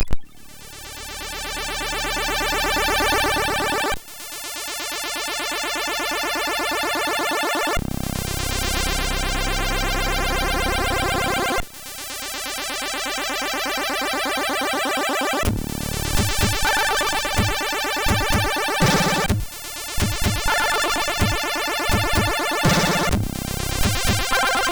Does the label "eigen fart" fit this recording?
No